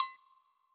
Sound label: perc percussion